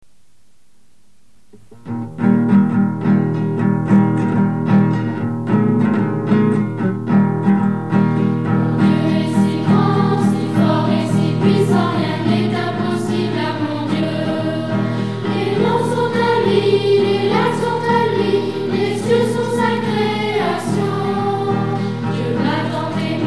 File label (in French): chant mon dieu est si grand